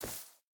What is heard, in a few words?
footstep burlap grass run; Rust